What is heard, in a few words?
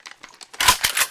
Gun Reload Weapon, Weapons Foley; SFX Kit